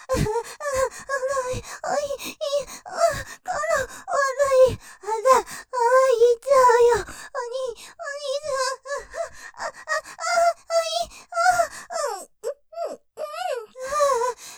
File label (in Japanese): 絶頂寸前; またイッちゃう！イッちゃう！; ステレオ; ループで楽しむ！シェフの気まぐれ抜き抜きコース